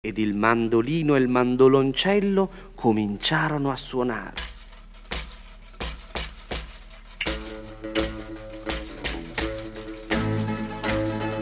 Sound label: Mandolin on Cell